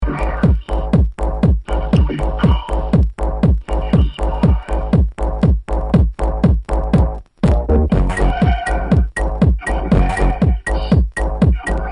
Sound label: EDM